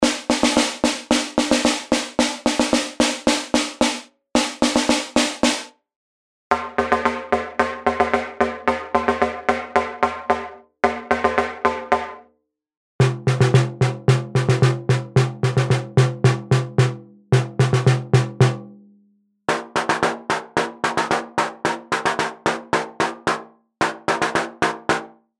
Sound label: chromaphone snare; aas